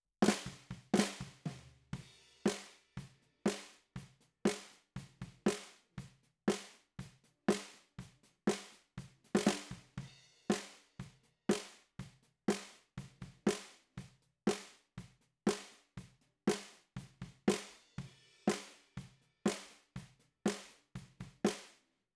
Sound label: SNR BOT